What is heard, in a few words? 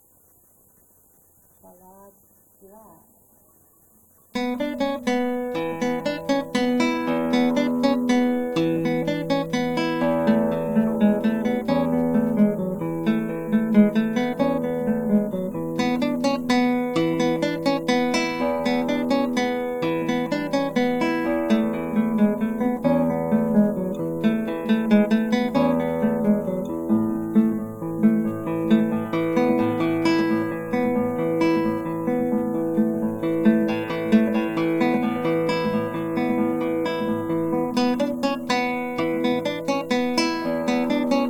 Ballad, Guitar; Scenic Music for Guitar Beginners